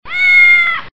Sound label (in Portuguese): Gato, toque para celular gato miando